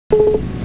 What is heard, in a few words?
BLOOP